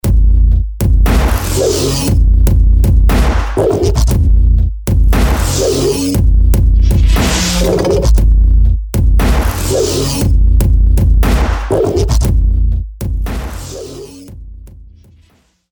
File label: boooombooom, hip hop